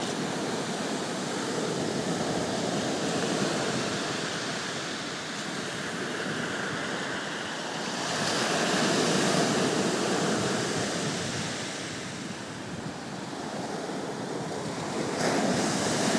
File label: brighton sea noises